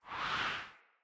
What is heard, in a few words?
Player Pre Landing Wind Gust